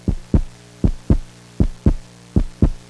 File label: RAPID HE